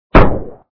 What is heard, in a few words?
snowball; Raft Wars